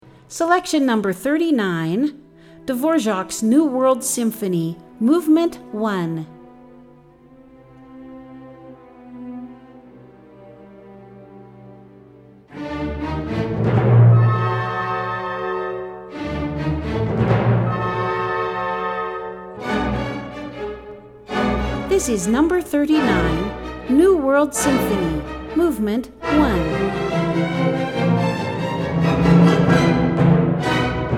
new world symphony movement 1, classical

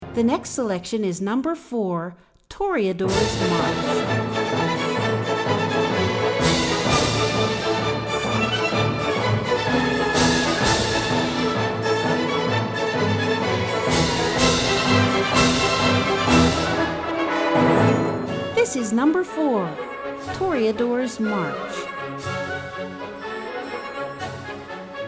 Toreador's March; Marche Du Toreador

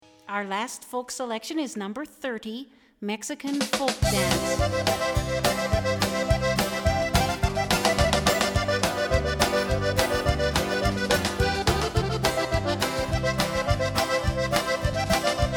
Mexican Folk Dance

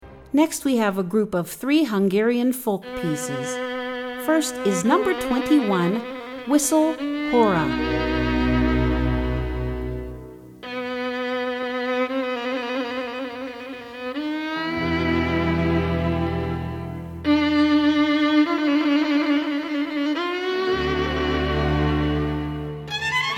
Whistle, Hora

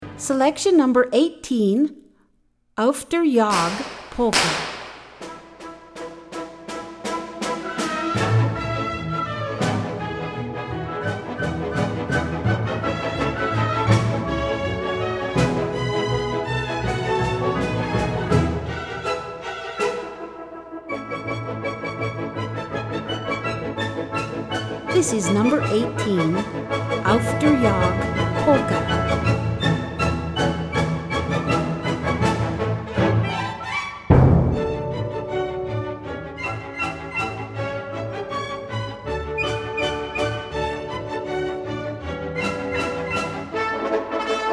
Polka; Auf Der Jagd

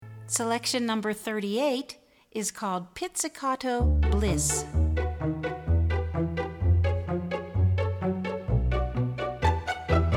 Pizzicato

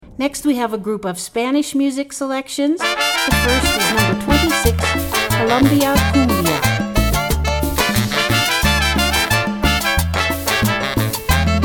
Cumbia

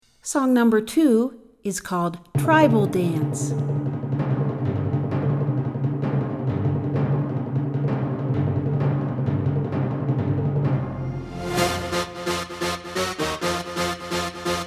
Tribal Dance

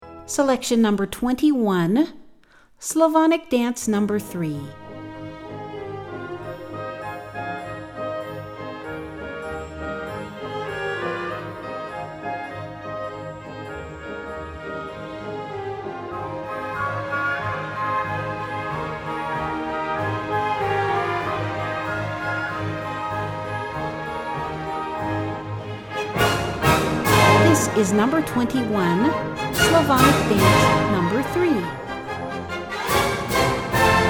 slavonic dance 2, classical

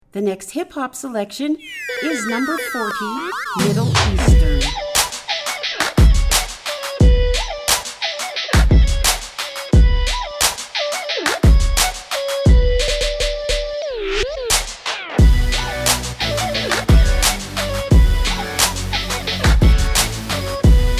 Middle Eastern

